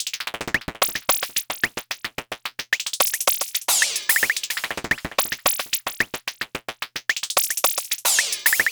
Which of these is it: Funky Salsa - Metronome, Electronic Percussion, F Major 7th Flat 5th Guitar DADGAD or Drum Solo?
Electronic Percussion